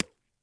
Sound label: CHIP